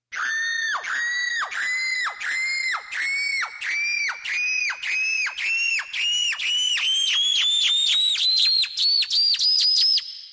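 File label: Girl scream group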